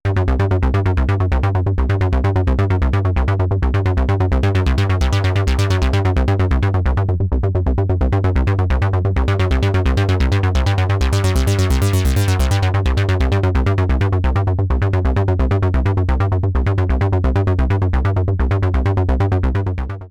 ACL Filter Overdrive Full Resonance Boost; ACL System 2

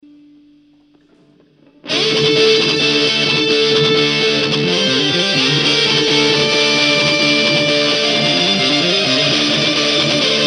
black truck high gain

